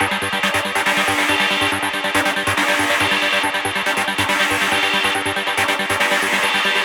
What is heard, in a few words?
Root G 140 BPM; Vengeance Samples; Special Sounds